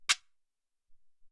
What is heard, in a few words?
Guira Sht